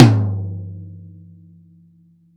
TOM, Drum Dry; East Collexion; Drum 1

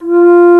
Alto Flute p F2; Digital Sound Factory Virtuoso

